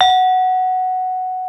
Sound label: BRONZE SAR, Bronze Gamelan